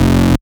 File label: Bass Shot F; Mutekki Media; Vengeance Electroshock Vol.2; Bass Shots